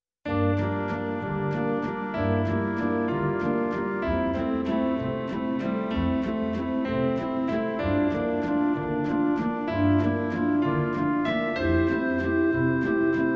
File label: Childrens Waltz